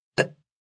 Burp Short, Burp, 1 child, short, fat, human voice, kid; Burping Sound Effects